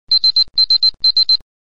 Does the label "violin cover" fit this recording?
No